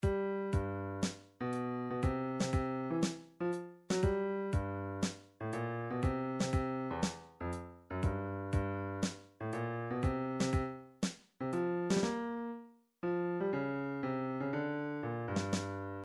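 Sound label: drums shuffle, midi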